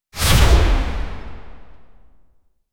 create a sound for a, arcane energy

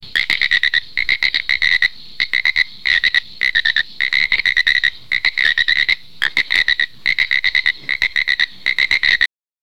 frogs eating more frogs

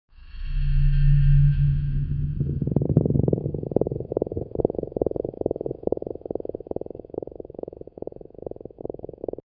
low rumble stone grinding

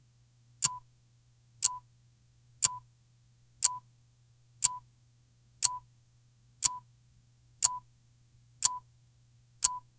tiktak of a watch; time

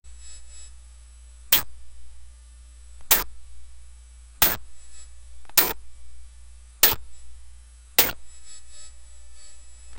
collect energy ball in 2d

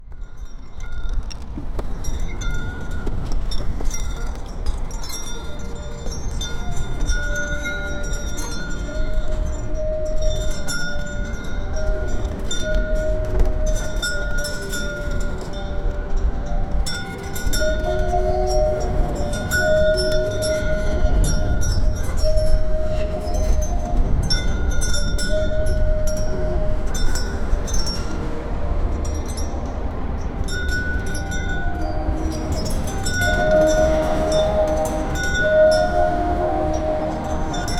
laughter on bridge creaki

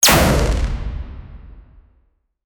sci-fi pistol; star wars; energy weapons

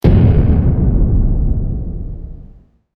windows xp blue screen of, error

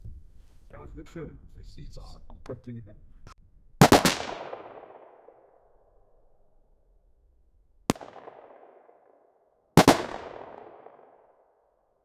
ak47 single shot sharp; indoors

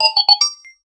magic spell merge game

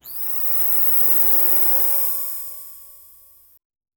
alien residue sizzle

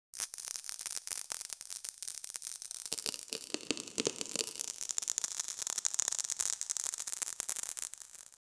magical dust powder being

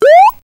character jumps

